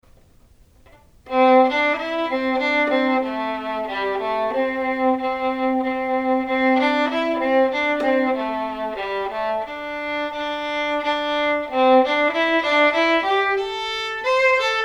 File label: viola old poor woman in c